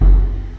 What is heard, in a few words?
Bass Drum